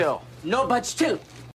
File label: Ringtone